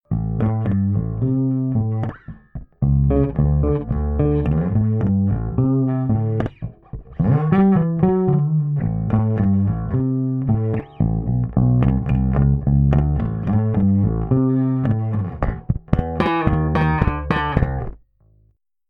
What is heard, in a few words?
short delay, bass; bass effects